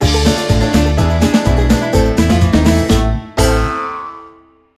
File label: Sting Sad; Wacky Races Crash & Dash; Wii